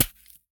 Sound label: Gore Stab Punch Hit; Just Gore 2; soundbits